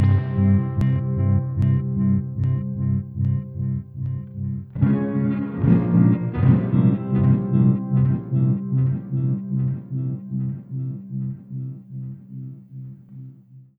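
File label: Rhythm Guitars C, Lounge; Zero-G Kocktail Kollection; Construction Kitz (Lounge); Volcano at Sunrise 074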